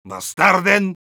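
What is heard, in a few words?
jeers, Spanish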